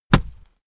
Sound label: punch